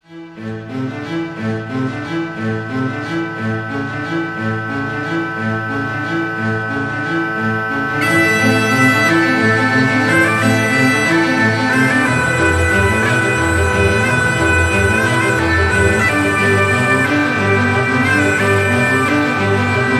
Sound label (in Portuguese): Orquestra; Guerra dos Trono